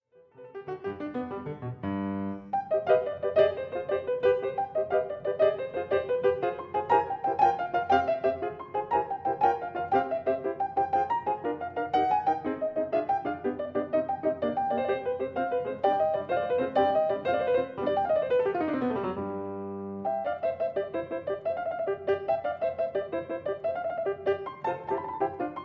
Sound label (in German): Sonate C-Dur; Longo 104